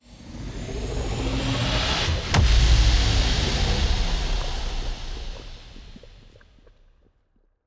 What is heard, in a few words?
toxic planet hit; weapons; Stellaris; toxoids